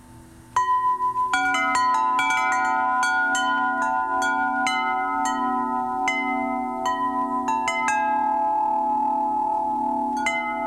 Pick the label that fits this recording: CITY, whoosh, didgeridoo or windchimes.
windchimes